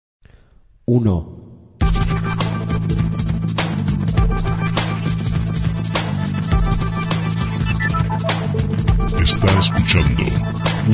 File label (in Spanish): sintonias